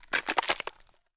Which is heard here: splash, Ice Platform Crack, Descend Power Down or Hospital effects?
Ice Platform Crack